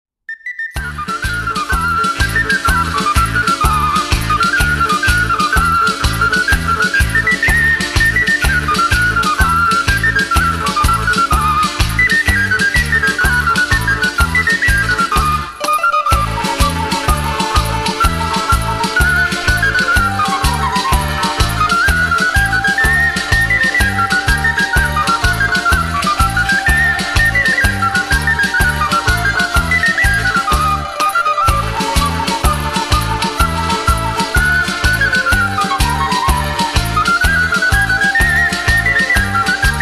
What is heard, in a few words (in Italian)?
Tarantella; a la carrittera